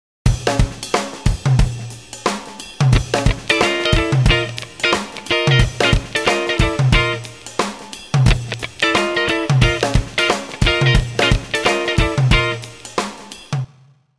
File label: Rhythm Guitar